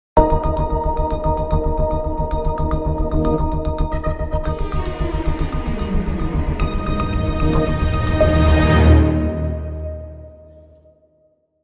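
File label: Crime Sting, No Percussion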